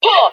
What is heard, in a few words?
huh, Voice